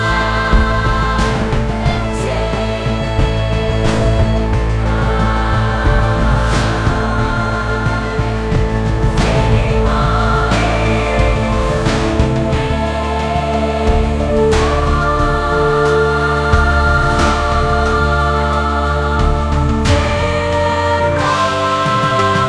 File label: epic soundtraack; google musiclm